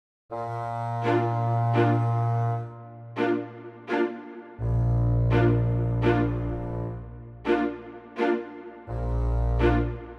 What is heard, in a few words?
saint saens elephant, French Horn